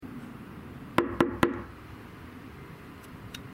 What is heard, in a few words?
knock fast